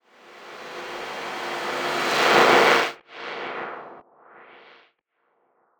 Reverse Fx; musicradar

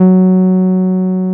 TB-303

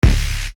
Bass Dr, EuroDance Bdrums

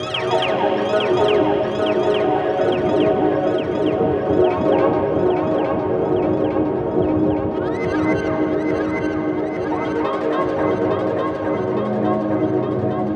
texture glissandi, One Shot Textures; Transmission-X

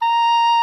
OBOE A#5, REEDS; Greytsounds VOL-1

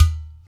UDU, African Contemporary; Supreme Beats; Spectrasonics; SB Udus

